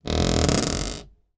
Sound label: door wooden internal creaking old